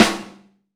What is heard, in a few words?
Std. Snare, Roland BK-5; Snares